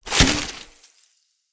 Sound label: Death Cabbage Growth; Enemy; Corrupted Sproutfolk; Combat